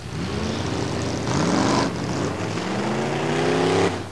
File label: AUTO, car sounds